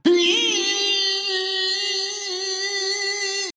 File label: "reeeeee"